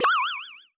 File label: exclamation mark